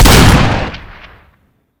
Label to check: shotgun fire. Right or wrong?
Right